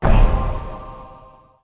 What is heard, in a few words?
bonus hit; netent